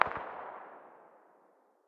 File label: ak very far distance, 5.45x39mm; tacz-tweak-pack; taczmechanics